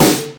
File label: boom bap hip hop snare one shot d sharp key; snare drum samples